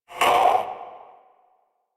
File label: Possessed Red Veil Vocal Pain; Warframe; Priest Quest